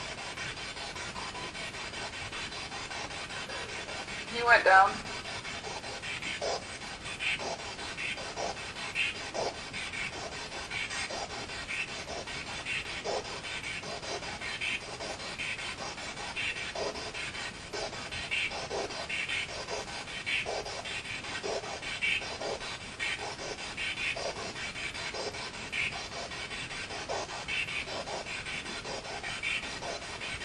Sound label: Balcony Spirit Box; twin city opera house investigation